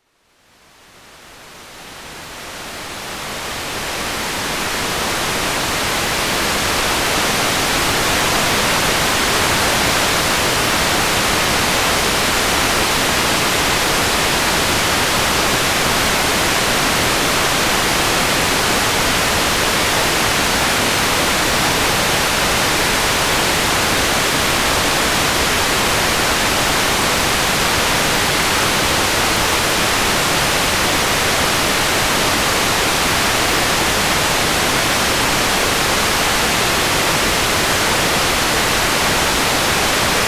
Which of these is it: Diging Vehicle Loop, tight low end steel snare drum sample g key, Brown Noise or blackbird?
Brown Noise